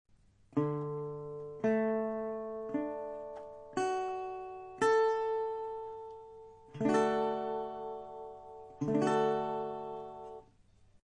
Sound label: D 7th Fret; fretting